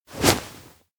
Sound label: turn fast; makoto; anemoi